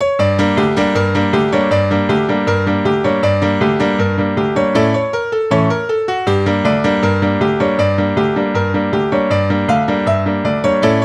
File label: piano